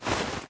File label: footstep cloth; guanyu; smite; hades